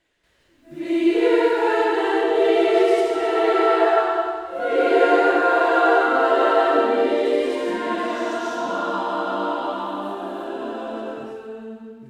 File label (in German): Auftakt Takt 35 ff Tutti, Konzertchor